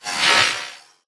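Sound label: Drone Movement Turn; Titanfall 2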